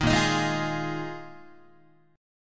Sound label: A sharp 7th Suspended 4th, Guitar; A#7sus4; strummed